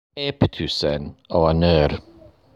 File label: Spitting